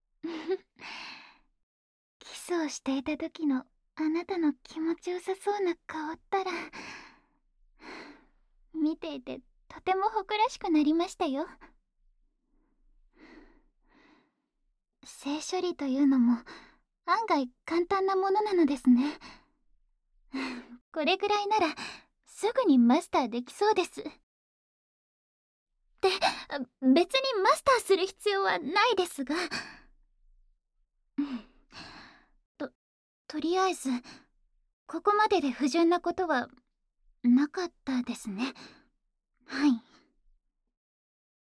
耳掃除 (右); 『耳掃除なら舌を使わなくていいではないですか!』

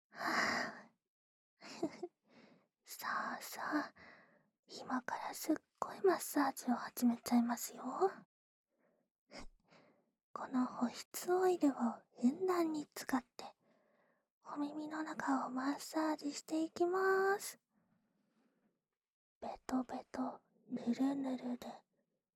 安眠用オイルマッサージ（右耳）, ASMR

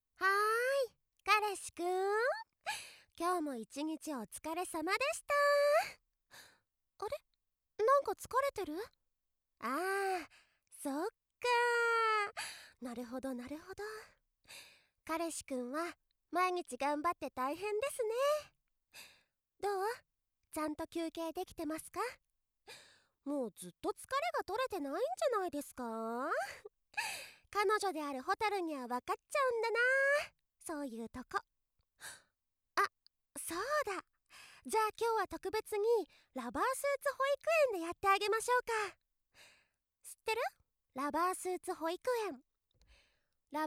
ママのお耳舐め舐め

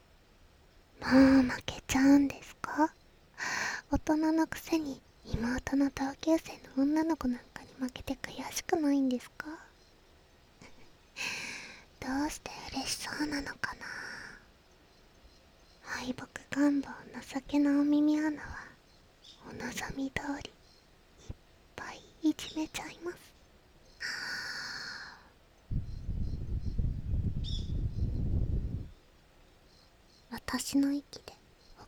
【右】耳ふー, ASMR

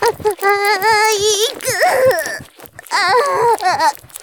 絶頂, ASMR; 騎乗位

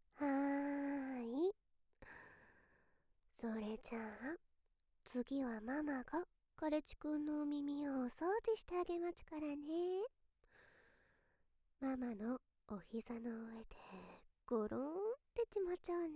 お耳舐め舐め お掃除タイム

